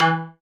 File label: Percussion